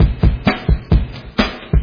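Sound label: drum loop dance muffled fast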